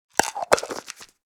Plastic pill bottle twist cap closing

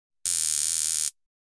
Police taser buzz stun gun

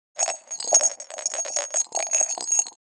Christmas tree glass ornament bell

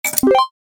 Slot machine credit insert game play

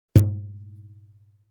Elastic rubber band pluck

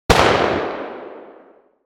Shotgun fire distant perspective

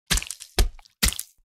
Knife or dagger slide and stab

